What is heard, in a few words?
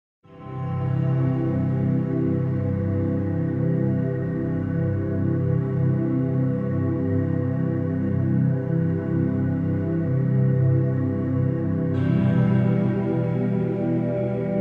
melodic ambient